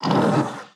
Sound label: Attack